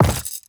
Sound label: quiver drop; inventory